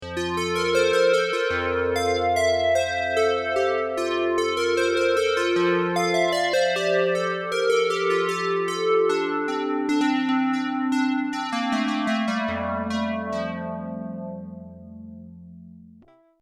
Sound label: UDO Super 6 Pulsewave Juno